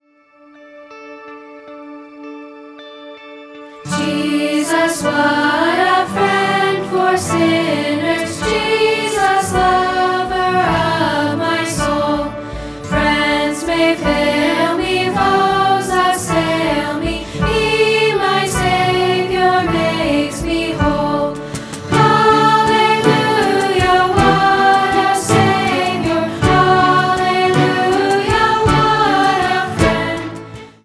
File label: Band